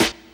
dirty steel snare drum sound a key, acoustic snare sound tuned to the A note; snare-drum-samples